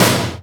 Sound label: TOM, Drum Dry; East Collexion; Drum 1